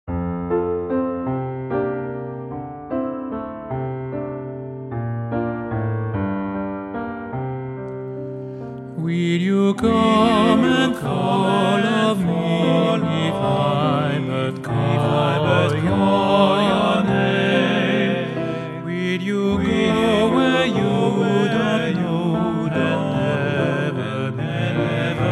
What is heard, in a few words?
will you come TUTTI